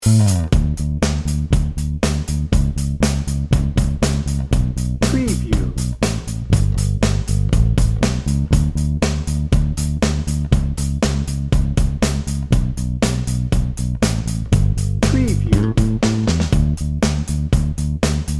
joy and hope dnb

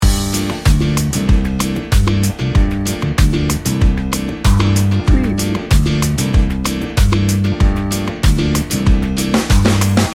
samba party loop

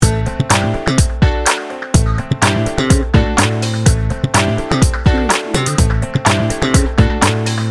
downtown funk loop

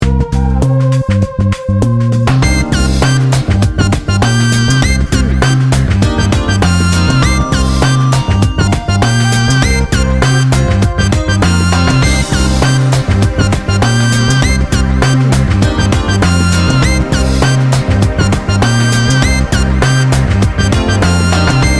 smooth and bouncy

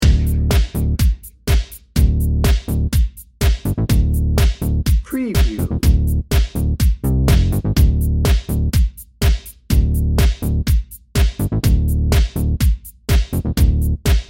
ya wanna go dnb

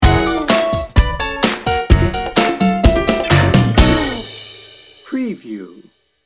happy hour sting bumper

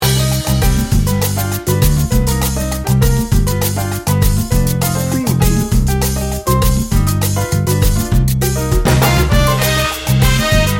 chips and salsa

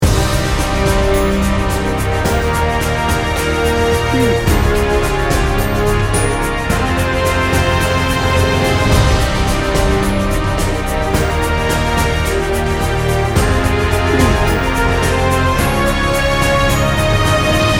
epic journey loop

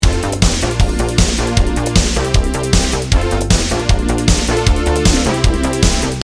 fitness fanatic loop

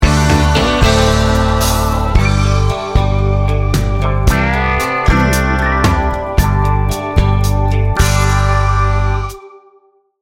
laid back country sting bumper

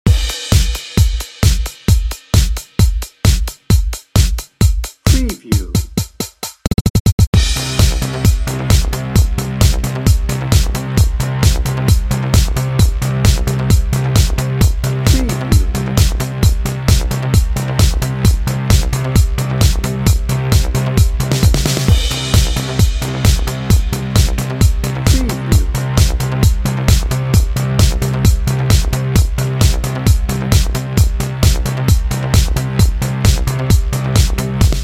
fist pumper dnb

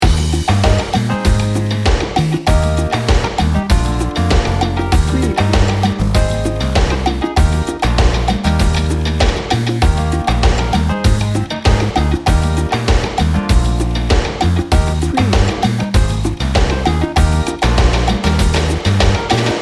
tropical party loop

